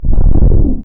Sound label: small explosion